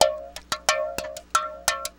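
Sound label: 120 BPM; RHYTHMLOOPS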